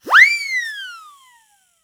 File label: WHISTLE, CARTOON; 1000fx